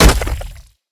staff hit; smite; ahpuch skin10

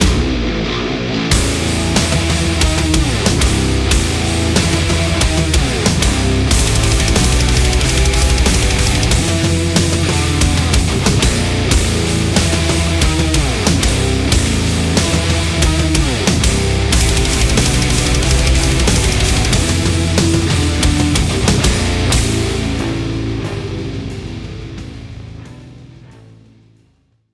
mus playerkill; Smite

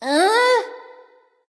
nv confused; cupid

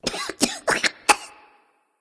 nv clear throat; smite; voicepack; ratatoskr

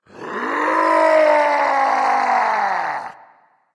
battle cry; Smite; camazotz

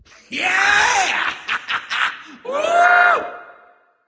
nv yay; smite; voicepack; xbalanque

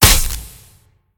pwr hit; smite; gilgamesh

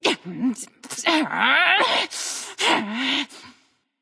grumble; daji

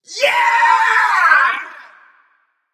nv yay; smite; xbalanque

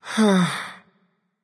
nv sigh; smite; voicepack; mercury

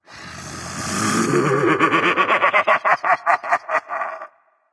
laugh berserker; cuchulainn

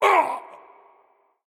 hitreact human male small; smite; npc; roman archer

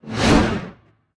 angelic basic swing; smite; thanatos

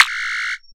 commando landing; smite; zhongkui

